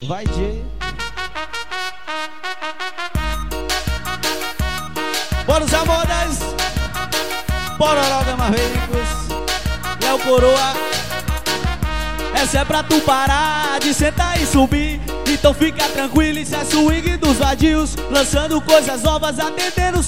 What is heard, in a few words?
swing